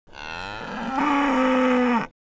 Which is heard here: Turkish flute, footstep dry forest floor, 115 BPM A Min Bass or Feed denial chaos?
Feed denial chaos